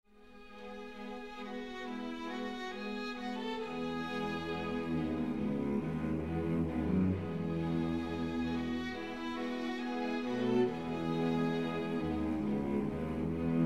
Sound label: String Serenade in E major, Moderato; muzica clasica; Classical Masterpieces